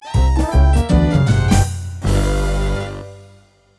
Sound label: music jingle lose